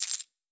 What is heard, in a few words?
Coins Handling; InventorySFX